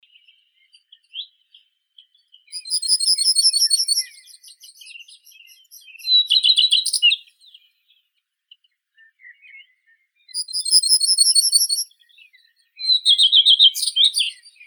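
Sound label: common redstart, Phoenicurus phoenicurus; gorihvostka obiknovennaya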